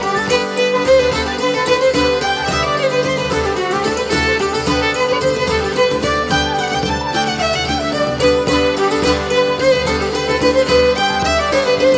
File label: White Cockade, Highland Ball